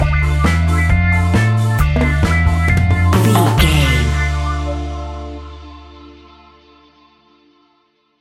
reggae ska fusion music cue stinger, Classic reggae music with that skank bounce reggae feeling; Great for summer